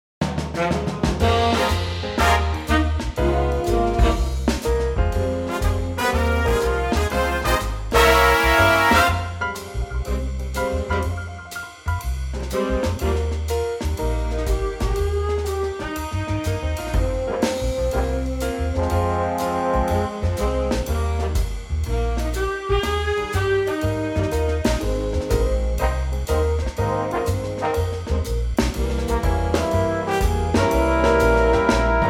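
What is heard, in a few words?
big band F minor; key - Fm - vocal range - Ab to Ab; Superb swinging Big Band arrangement; Punchy brass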